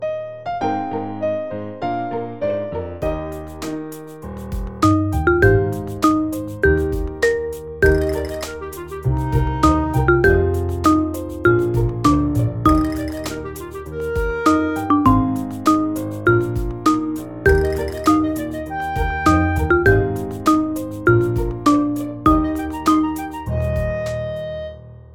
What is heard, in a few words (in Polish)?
plas bez wokalu